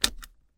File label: metal fitting off, car